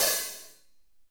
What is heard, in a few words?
Loose Fizz, Drums; PX7; Digital Sound Factory Protean Drums